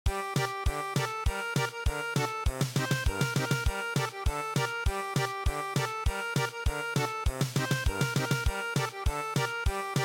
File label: German Polka